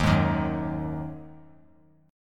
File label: Eb Minor Double Flat 5th Eb; JGuitar